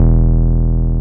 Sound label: 303 SUB E2F, SUB BASS